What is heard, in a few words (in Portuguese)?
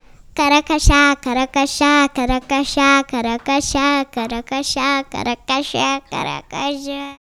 arremedando o cachá; cachá; Pia o pio… Os pássaros e as árvores que fazem parte da vida